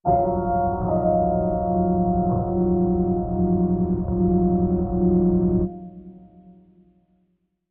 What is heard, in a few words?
Pianoscape Sting